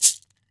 CABASA GOLD; AKAI S-Series CD-ROM Sound Library VOL-5

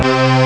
desert string; Amiga Soundtracker Sample Packs

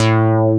SELECTED BASS; Masterbits; Dance Trax

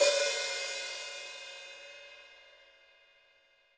HiHat & Crash, Roland TD-20; HiHats

